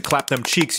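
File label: clap em cheeks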